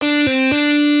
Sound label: Hammer ons, guitar